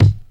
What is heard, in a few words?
short kickdrum g sharp key, drum single hits one shots